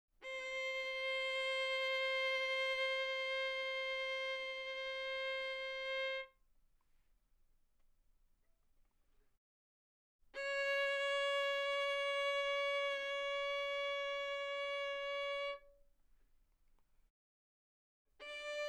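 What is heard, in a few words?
Violin arco mezzo forte sul A C5 B5; Strings; violin2012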